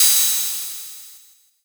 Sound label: cymbal